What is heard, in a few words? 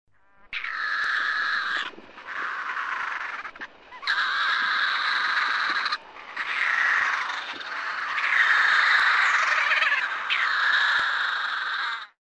Audubons Shearwater